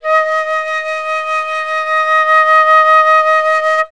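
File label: flutes D#4; Best Service; Peter Siedlaczek's Advanced Orchestra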